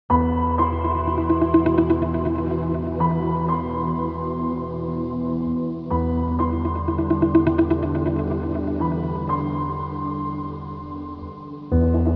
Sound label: Suspense; g aroyib doktor turk serial